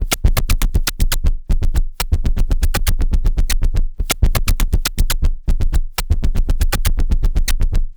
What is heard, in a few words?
Structured Rhythm, IDM Rhythms